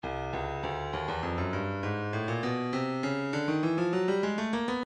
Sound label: breakbeat 100 bpm; Stylus